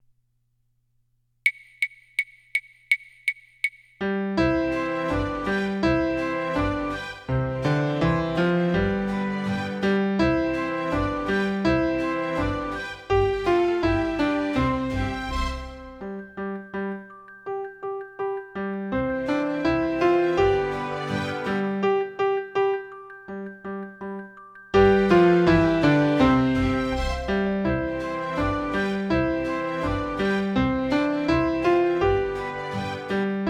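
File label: solo with accompaniment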